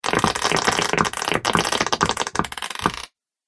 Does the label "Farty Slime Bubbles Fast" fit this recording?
Yes